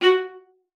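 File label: F#5, viola spiccato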